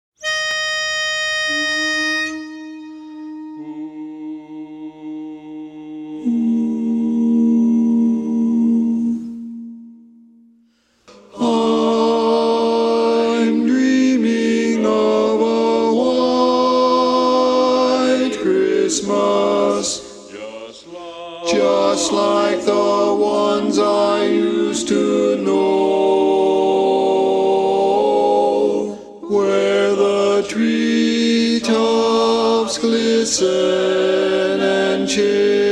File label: shorter baritone; mono